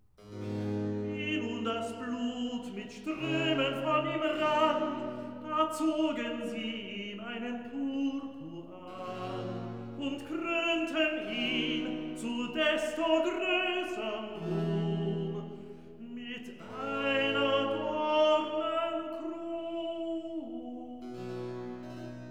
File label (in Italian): Recitativo; Wie nun das Blut; Brockes Passion